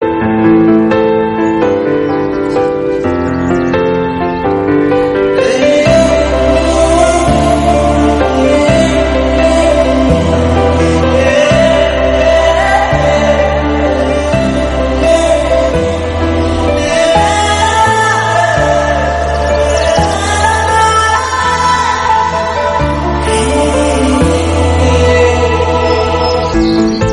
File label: Instrumental, Telugu; Darling